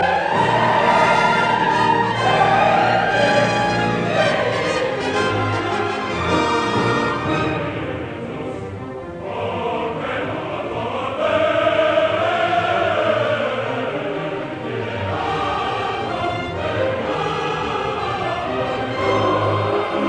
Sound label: Symphony in E flat major Hymnus Veni creator; Symphony of a Thousand; Part I; Leopold